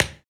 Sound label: Body Perc